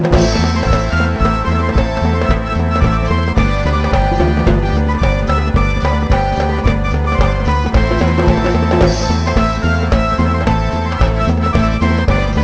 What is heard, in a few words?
lezginka